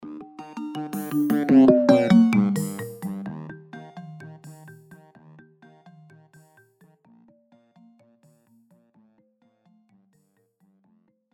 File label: doppler shifted ringtones ios